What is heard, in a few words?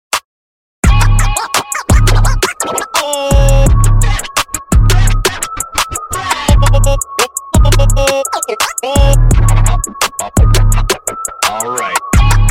Scratch Intro Outro 85Bpm